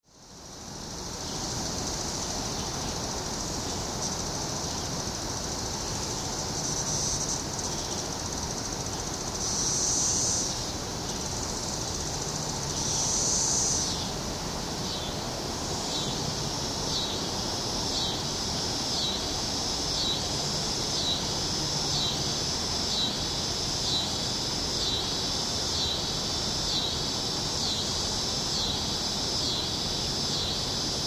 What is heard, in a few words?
cicadas, 17yr cicada; Excerpts of the 17-year Cicada Brood XIII from Glenview, IL (2007)